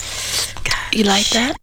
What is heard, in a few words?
SEX; Zero G; Man Machine